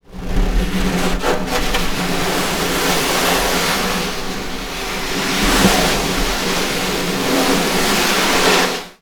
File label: Snare Drum; Percussion Samples